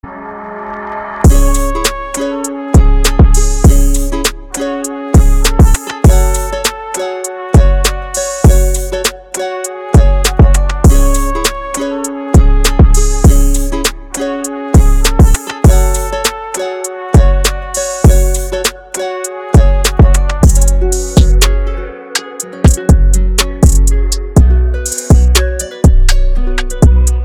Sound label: trap madness bundle